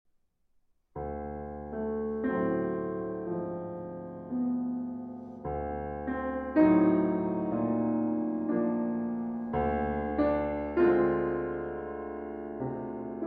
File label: Sonata For Violin and Piano in A Major, Recitativo-Fantasia